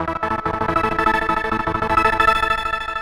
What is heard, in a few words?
synth